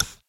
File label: click; frappe